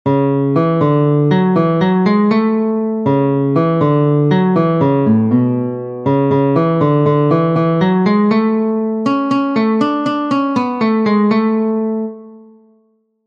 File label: Humpty Dumpty Guitar